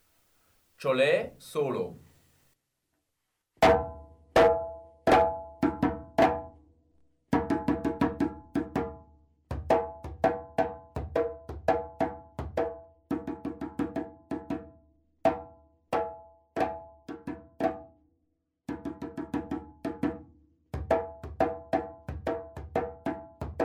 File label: djole solo, djembe; Kapitel 3 - Solis; djembe aufbaukurs 1